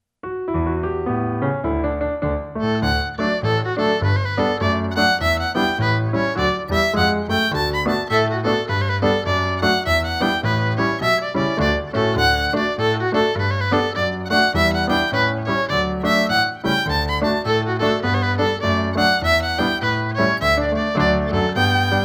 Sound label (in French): Gigue du Violoneux